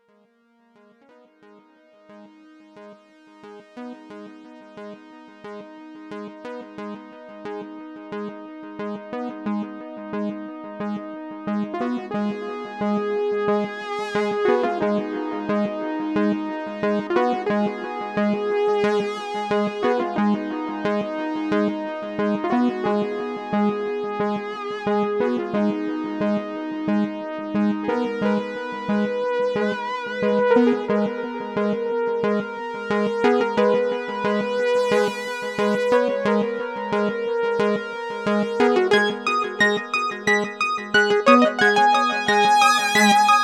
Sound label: General Music S3 Live Sequence